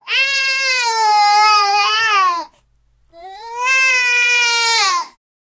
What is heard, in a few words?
an infant crying out for